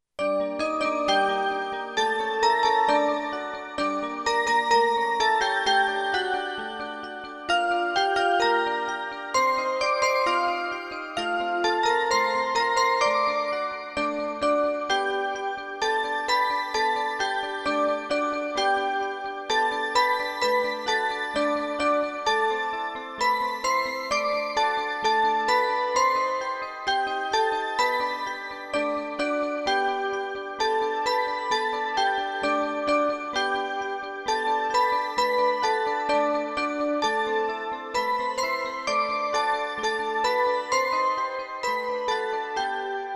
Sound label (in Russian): Мелодия на часы; на День матери